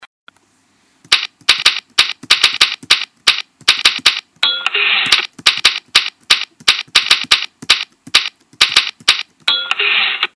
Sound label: typewriter